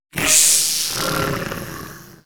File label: Creature Snarl Mono; Audiomeals; Dark Creature Sounds